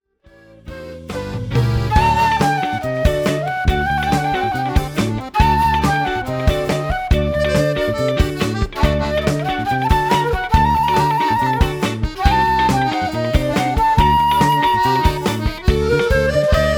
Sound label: Flute